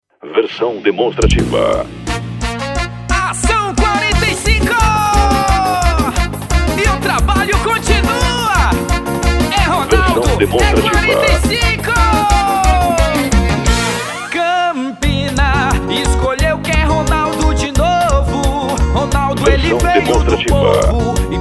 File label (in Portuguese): axe jingle; eleicao 2020; ronaldo 45